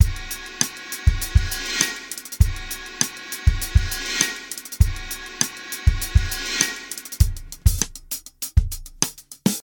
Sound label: Riddim Reverse